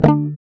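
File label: GUI D, acoustic guitar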